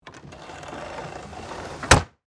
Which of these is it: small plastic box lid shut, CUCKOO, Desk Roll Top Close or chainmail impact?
Desk Roll Top Close